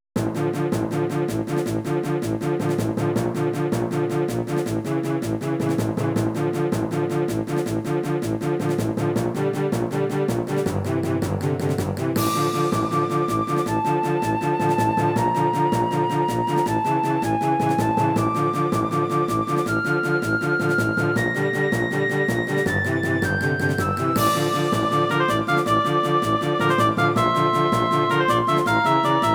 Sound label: Forest Terrors